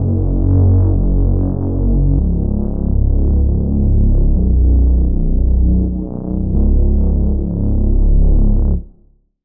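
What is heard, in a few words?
Reese, Bass; Reese - Heist Crew; Sylenth1; Routed Sound; Sylenth1 Expansion - Noble Gangster Vol.1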